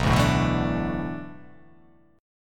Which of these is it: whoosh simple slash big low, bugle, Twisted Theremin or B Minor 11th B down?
B Minor 11th B down